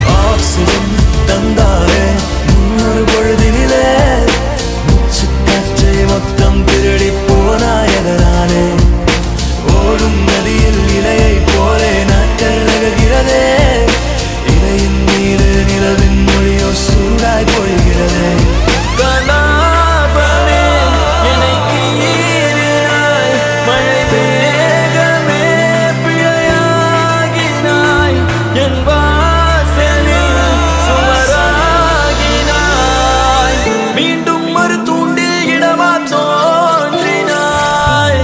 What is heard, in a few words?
End Version Tamil Movie Ringtone